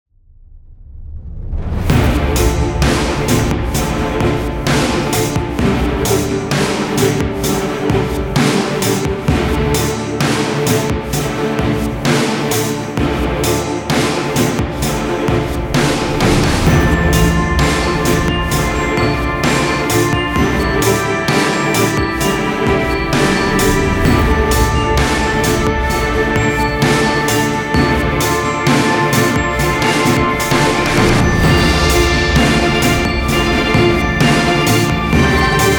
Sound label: 130 BPM